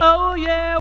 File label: "oh yeah" SUNG